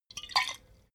bottle vodka shake single; berufe

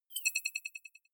click decay high

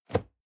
cardboard box medium place; objekte

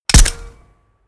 gun shot silencer designed mechanic

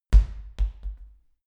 medicine ball bounce, fitnessstudio; sport freizeit

